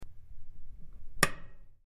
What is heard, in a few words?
Button Old Button Pre, switch clicks